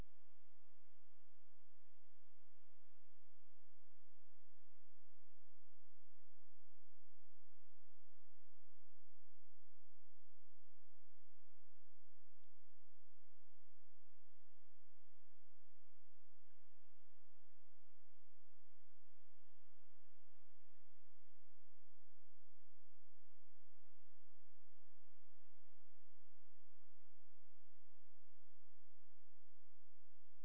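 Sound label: hydrophone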